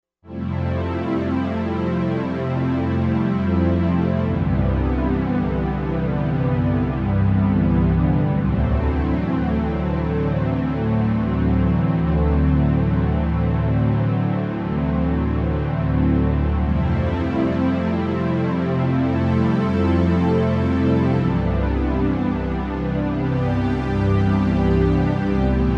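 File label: Strings Analog Strings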